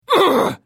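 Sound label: Hit, Khajiit female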